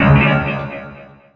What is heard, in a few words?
Pickup Scifi; SFX Kit